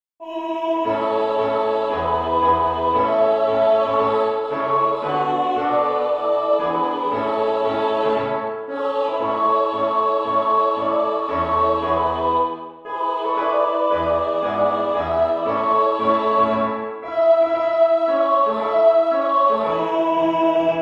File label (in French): hymne national francais; rouget de lisle claude joseph